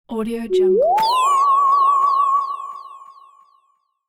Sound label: good cast